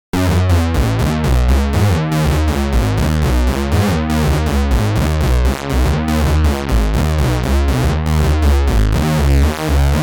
8bit Gamel; Tyrell

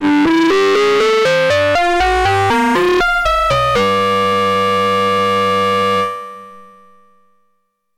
Behringer Neutron Ring Type Distortion Lead

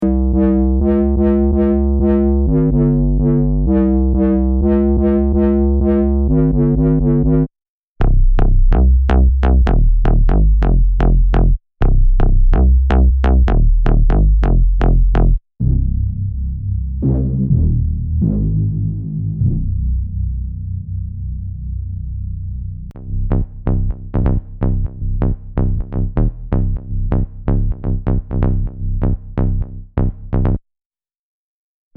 Bassline Loops

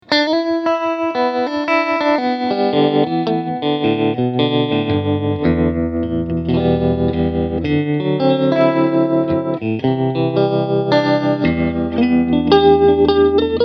Fender 62 Princeton Tone Vibrato

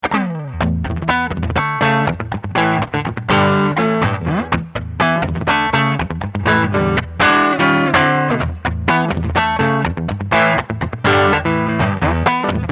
Clean Riff

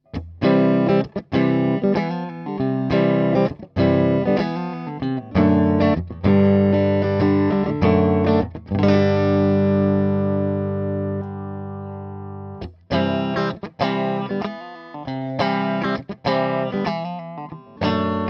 Strumm

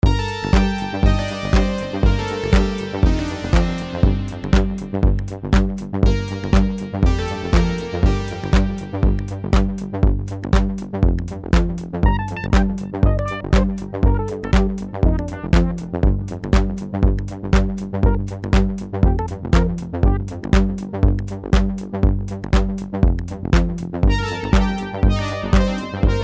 Roland JX03 medley

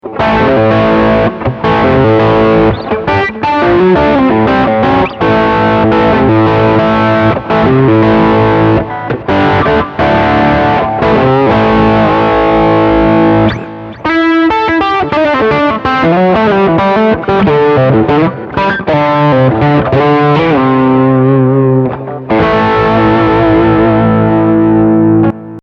MOOER Clean CAB SIM HK red Ch

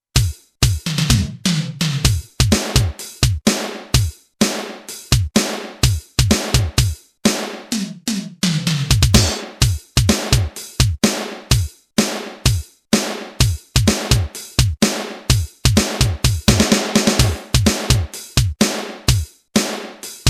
Akai XR10 Beat Rck

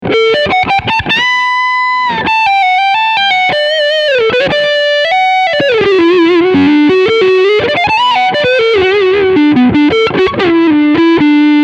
Front HB Overdrive

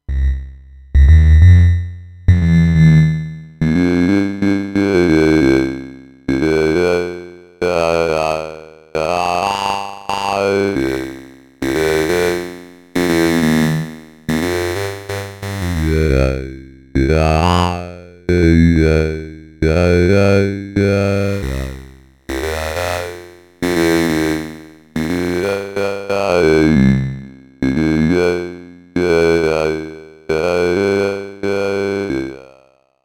Formant

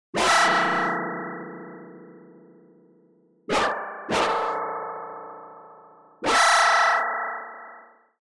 FX

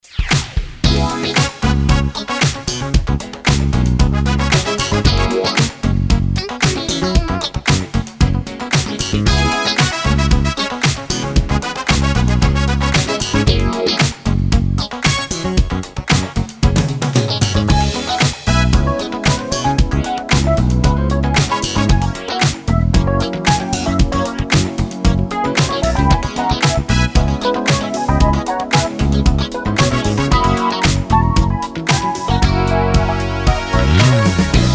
DJ Groove

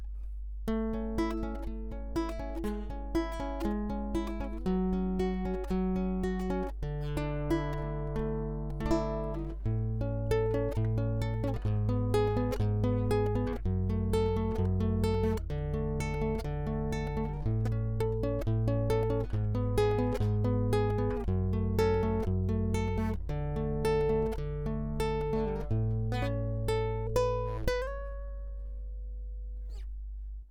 Fingerpick Piezo

